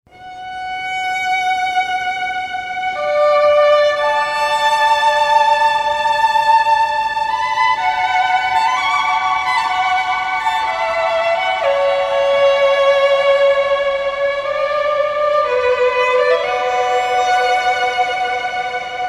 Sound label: Solo Violin